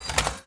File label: p atk spanner